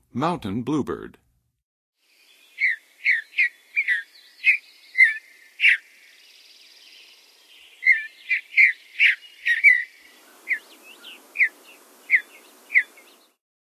Mountain Bluebird, Songs of Birds of North America; Western USA; Western USA Songbirds